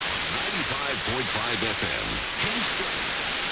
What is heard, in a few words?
95.5MHz; KSTO